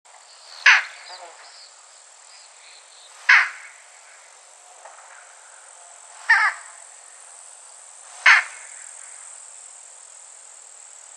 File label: coloeus dauuricus, Przykłady głosów kawek; kawką srokatą; krukowatych (Corvidae); ptaki